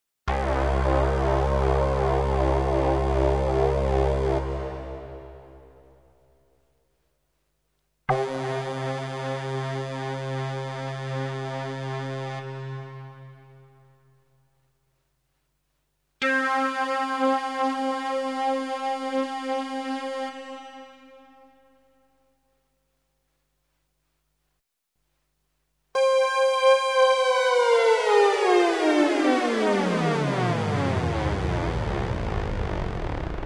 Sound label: cs80 string; Strings demo